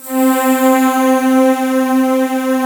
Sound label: POLLEN, SYNTH; East West; Global Sounds